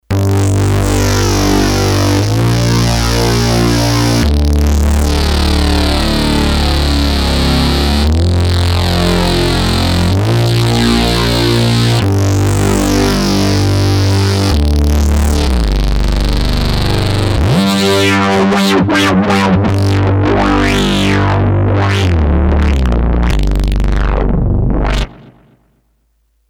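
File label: Multitimbral, M-Audio Venom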